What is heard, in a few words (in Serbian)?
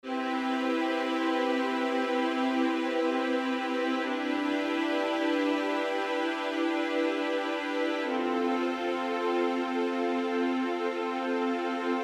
akordi gudaci dur